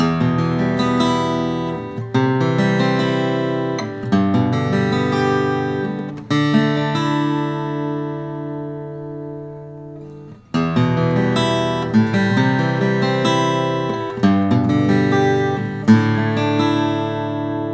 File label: collings 02hgvn; natural